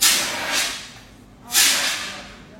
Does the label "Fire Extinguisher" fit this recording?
Yes